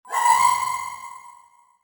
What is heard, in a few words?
scare; whiteface